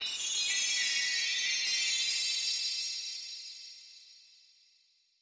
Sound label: Snow Ice